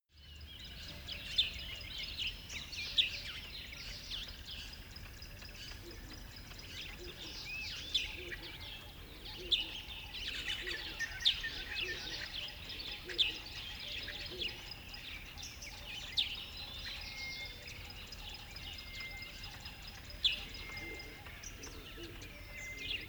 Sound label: river bed dawn birds